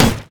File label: golem basic attack; monsters; LoL